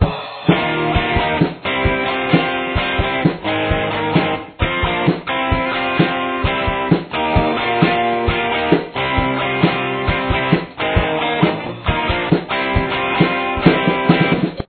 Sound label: intro guitar